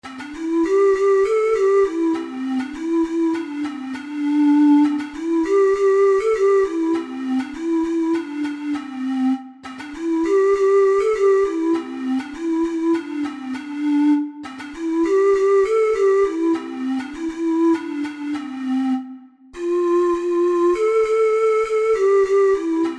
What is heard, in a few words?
oh susanna, ocarina six hole